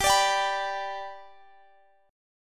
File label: G Major G